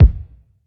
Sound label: small reverb bass drum e key